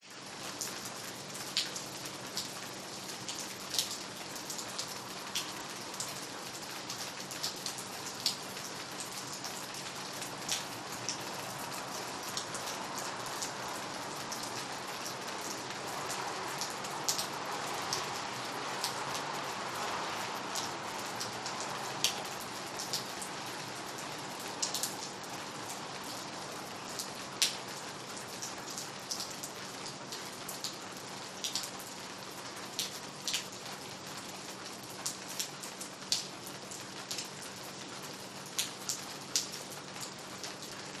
RAIN Exterior Rooftop Medium Pavement Steady City Rumble Stereo